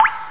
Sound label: Sploik; ExChaos